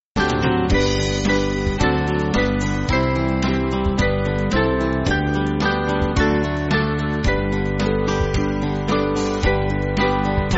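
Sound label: Music Backing Files for Churches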